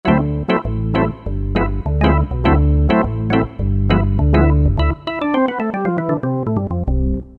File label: Percussive E-Organ; Roland PN-D50-01